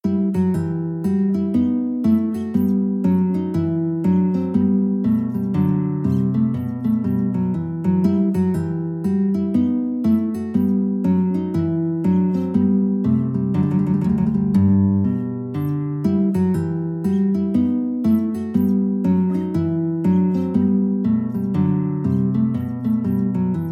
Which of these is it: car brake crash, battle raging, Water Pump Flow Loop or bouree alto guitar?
bouree alto guitar